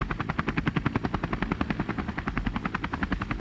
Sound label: helicopter loop